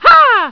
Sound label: shoot gren; mario; supermariobros